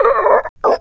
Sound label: drown; quake2; drfaustus